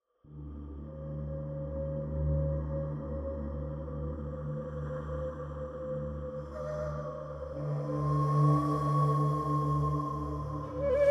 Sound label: Wind Spirits, der Klang des mesoamerikanischen Dschungels; eduardo tarilonte